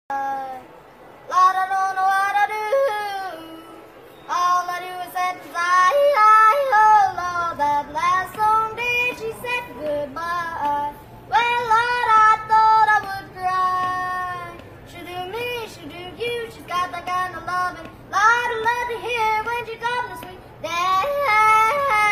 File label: Yodeling Kid; Walmart